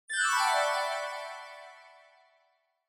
Scene Change High Down Fast

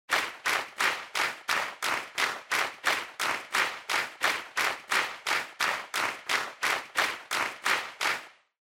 Handclap Fast

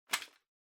Power Strip Hand Hard Unplug

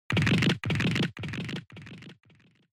Fracture Delay

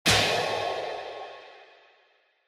Impact Low; Onoma

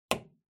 Glue Stick Put Down

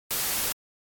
White Noise

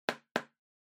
Tablet PC Tap Double

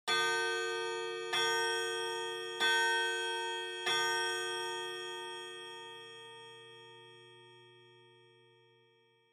Pendulum Clock Chime High

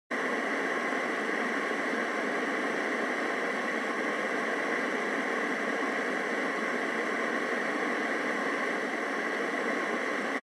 Radio Signal Noise AM

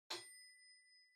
Metronome Far Bell Single

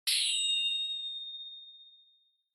Flash High Long; Onoma; オノマトペ 光る; 高 長

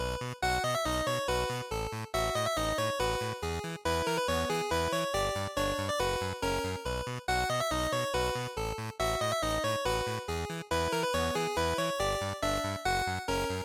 GB RPG Victory Loop140

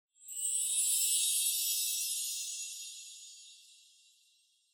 Onoma Sparkle Short; オノマトペ キラキラ08-1(短)

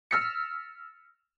Piano High Dry

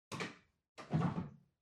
Washer Front Load Far Detergent Drawer Open

